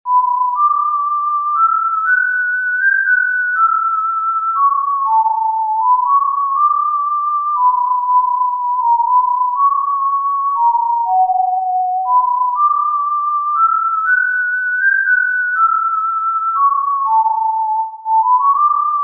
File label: Tin Whistle; TIN-D; Greensleeves; Piano easy and beautiful